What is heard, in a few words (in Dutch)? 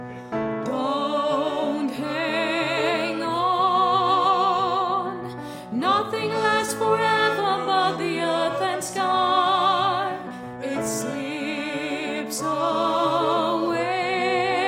alt